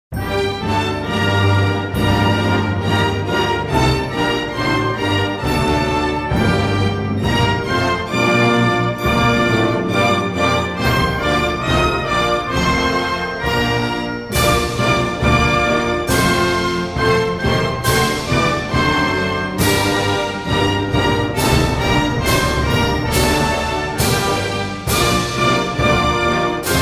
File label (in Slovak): slovenska hymna instrumentalna verzia